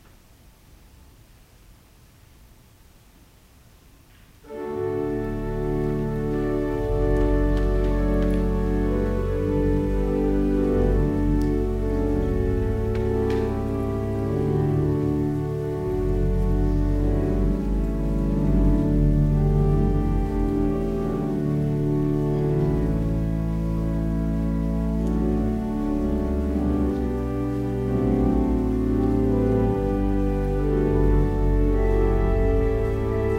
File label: Organ Music; Netherlands Reformed Congregation, St. Catharines, ON